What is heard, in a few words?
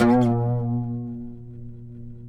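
pluck OUD; Roland - LA Composer vol. 3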